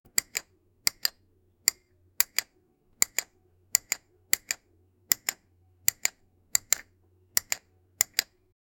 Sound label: button click sound